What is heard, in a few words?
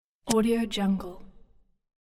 lighter